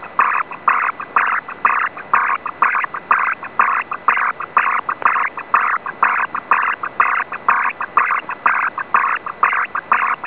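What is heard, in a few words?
RS240 81 traffic; Rohde & Schwarz 8-tone ARQ in ISS-mode sending tfc.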